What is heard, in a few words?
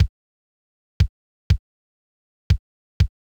Classic 626 Kick; hydrogen